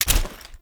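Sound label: UI select item; Veilbreaker; Kahl Customization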